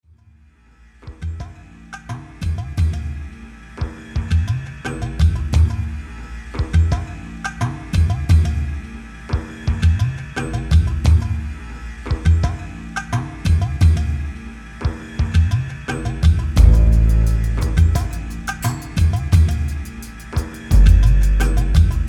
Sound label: oud authentic